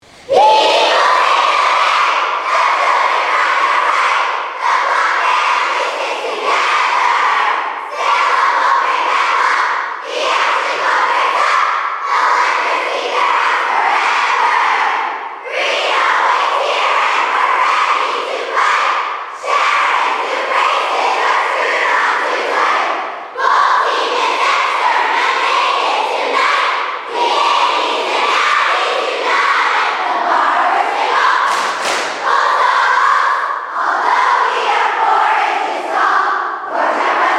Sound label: Camper Fun campsongs